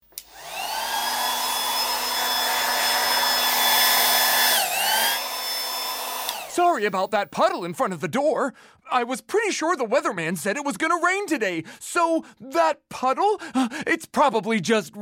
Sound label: Dog Puddle